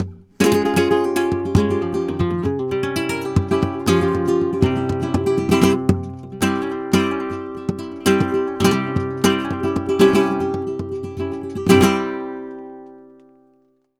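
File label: guitar, 230, BULERIAS, Flamenco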